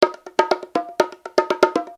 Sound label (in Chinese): 手鼓